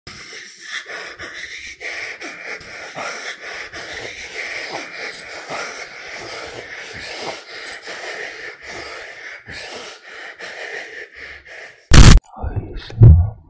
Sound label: Breathing Zombie Sound Effect